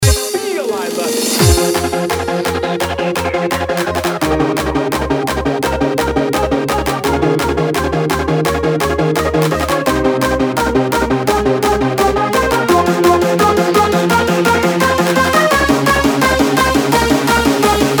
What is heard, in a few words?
Chords